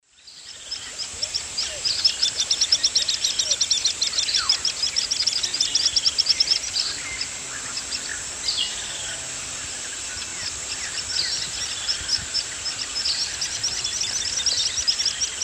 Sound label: Chelidoptera tenebrosa, group flight calls; S. America; Eastern Lowlands